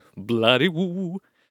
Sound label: Attack Vocals; Present Elf